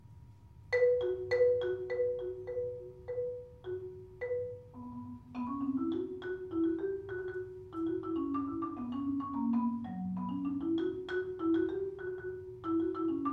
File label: keyboard cycle medium